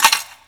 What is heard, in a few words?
OPENER, INDUSTRIAL